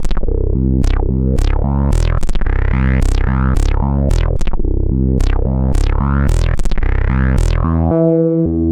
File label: Bass Line; Eclectic Electronica